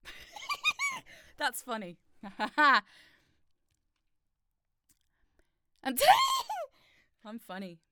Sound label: TEEHEE